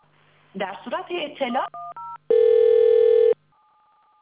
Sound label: DTMF